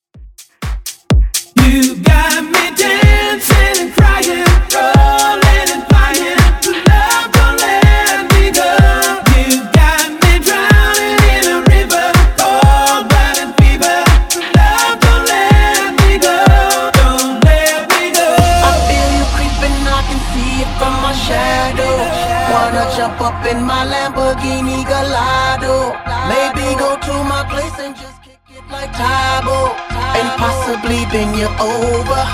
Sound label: Bass House Hype 125bpm; Rworkz